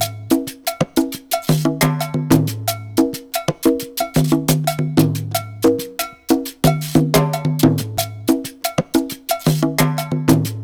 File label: Perc, Percussion Latin